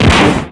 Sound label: pain; quake2; vortex